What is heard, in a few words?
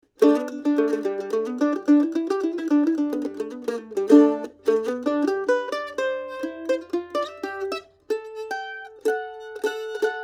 Mandolin